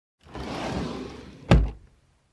Drawer Close

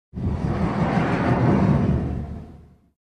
Warehouse Door railings

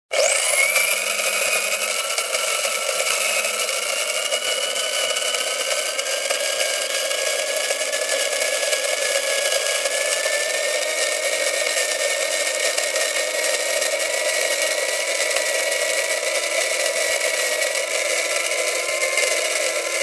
Blender turned on

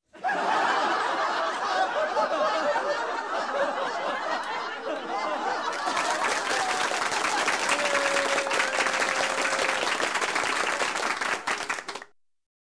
People Laughs Cheers and Claps

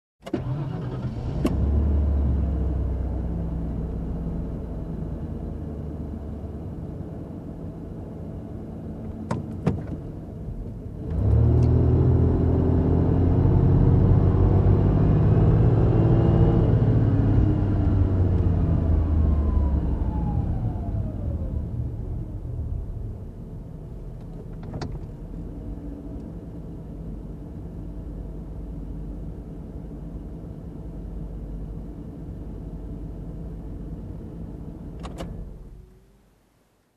Short ride in car

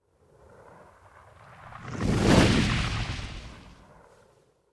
Big Flame Hit Sound

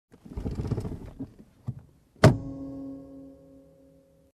Car adjusting driver seat and closing door

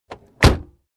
Closing Car Door

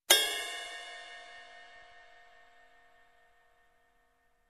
Single Ride Hit

